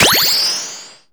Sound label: SE GAME BREAK; maimai